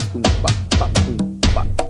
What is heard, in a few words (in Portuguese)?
tamborzão